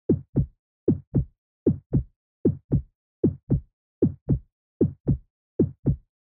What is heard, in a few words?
Heartbeat Fast Loop